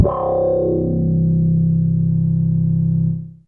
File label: Wow Bass C2, Fairlight CMI IIe